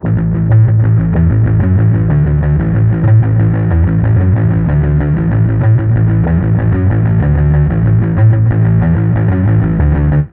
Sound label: Bass Guitar Loops